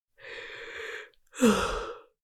Woman short yawn

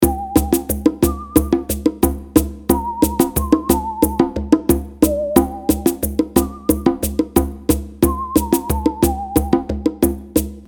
Tribal drum and wind loop

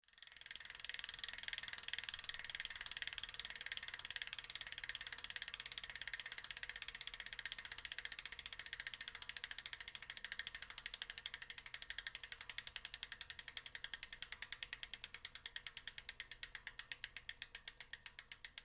Bicycle wheel spinning close perspective

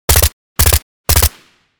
Sterling submachine gun two three round bursts

